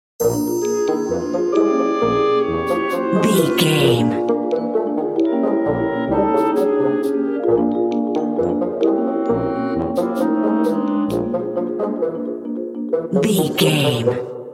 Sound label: suspicious sneaking loop